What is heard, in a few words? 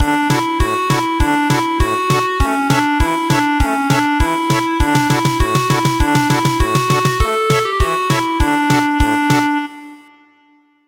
German Polka